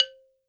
balafon c3; World Instruments 2; WORLD PERCUSSION; PS BALAFON

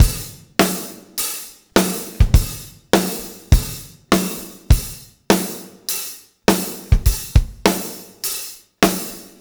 BACKBONE, Backbeat

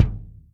Maple, Yamaha DTXpress Acoustic Kick

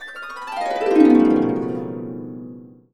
B, Harps

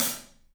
PAISTE HAT, Drums; 3D Sound

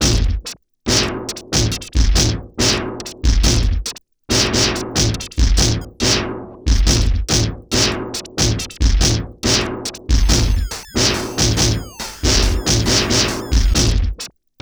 TRIPHOP; INIS; Funky Xtreams

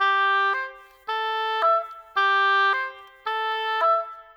Oboe, Orchestral; Sonic Foundry (Sony Creative Software); Orchestral VOL-2; Modern 21

